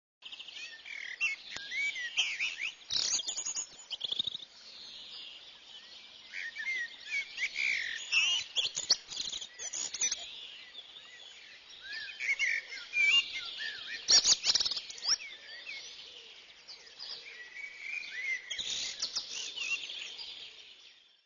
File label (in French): nature oiseaux leger